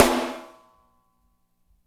hyper hi, snares; Spectrasonics; Burning Grooves

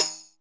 TAMBRINE, PERCUSSION; Crypton - DrumBase5000

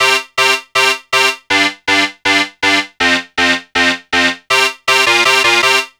Dance Synths, Hardcore Loops, Synth Loops

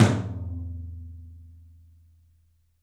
OPEN TOM; 3D Sound; 3D Drums

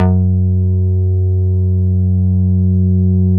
TUBE F#2, BASSES

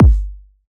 kick drive

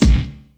SP1200 KIC, Kix Snares; Hip Hop; E-MU Formula 4000 Series Vol. 1; Hip Hop Nation

